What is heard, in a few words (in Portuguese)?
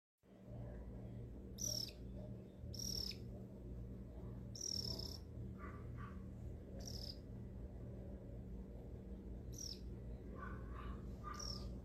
canto da calopsita perola